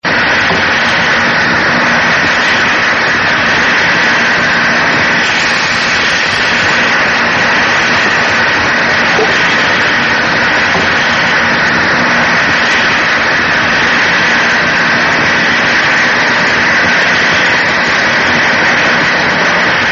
Buque de guerra de turbina: buque con propulsión a turbina de vapor girando a 108 r.p.m; subacuatica

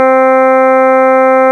flauta

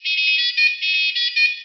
Equalizer

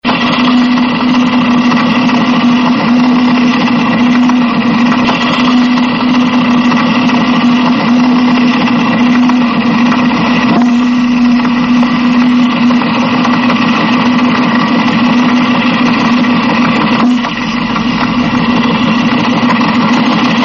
Mercante diesel: buque mercante impulsado por motor diesel a 98 r.p.m.; subacuatica

